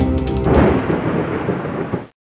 f bolt

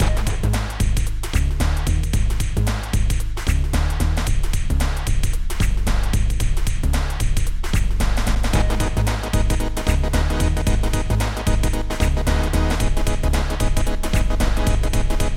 tune

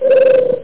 Ring In and Out; StarTrek

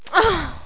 groan; marbles

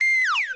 nail

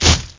thud; alienbreed3d2

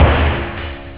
dzing; OctaMED SoundStudio V1

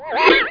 swirl; 11,025 sample rate; 0.06 seconds; Little Red Riding Hood

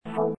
ERROR; Arcade Lines

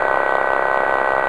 Buzz; M4 7.5.2; M4™ (PowerMac)

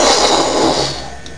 Explosion; Star Fighters

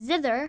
zither

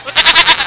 goat

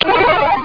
Clik Tweedle; Total Distortion

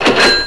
cash register; Snavely! 1.0.4

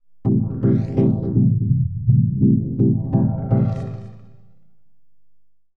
SYNTH VOCAL 125 A; Keys

